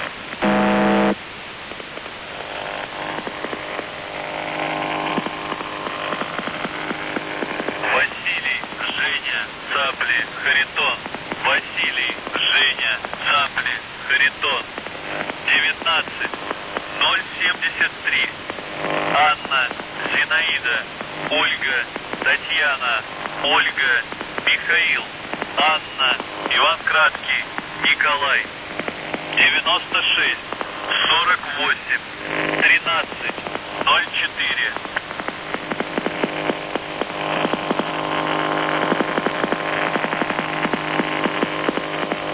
buzzer usb msg

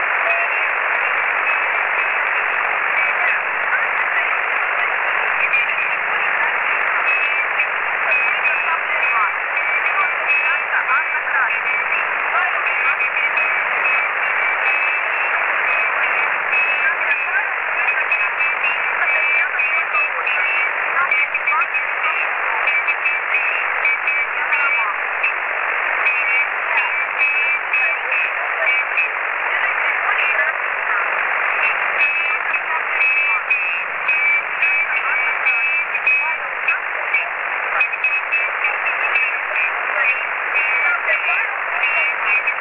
usb msg

